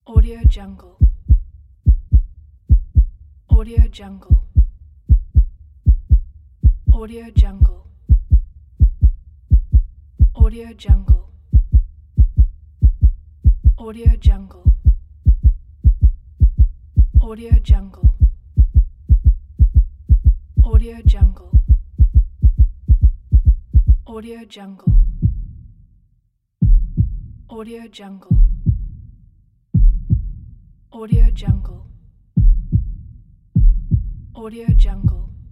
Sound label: accelerating heartbeat